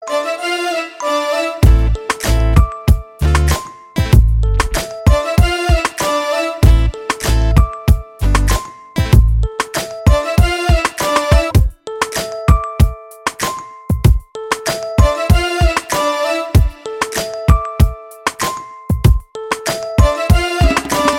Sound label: jingle bells